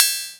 cymbal sample c sharp key

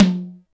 tom single hit f sharp key; tom drum samples; one shots

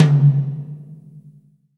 low mid tom sound c sharp key

